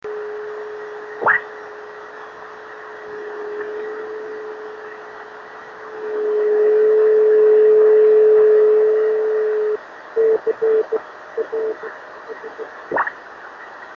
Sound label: 26690KHz